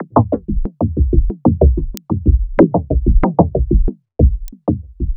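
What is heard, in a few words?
Percussion, dance; Sonic Foundry (Sony Creative Software) - Future Retro Dance Excursions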